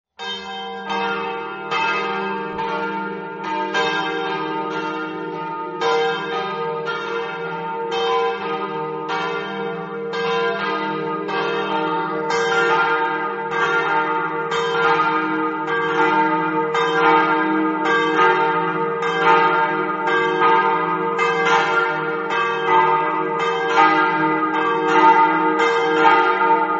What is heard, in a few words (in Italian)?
campane